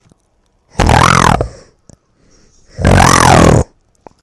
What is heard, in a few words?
sussy growl